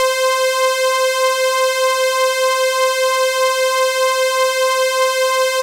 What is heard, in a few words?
3 Saws C5, Moog Voyager; Moog Voyager Sounds; VES1 3 Saws